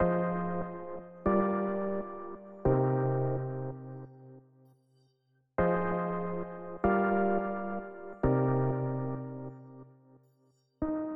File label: Classic Melody Loop - 86 BPM C Min Keys; Melodies; Loop Stems; Cymatics; Oracle Sample Pack